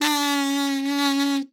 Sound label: KAZOF D4, KAZOO; Xsample VOL-9